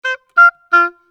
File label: 90 c min oboe; PowerFX - Downtown Orchestra; Orchestral arrangements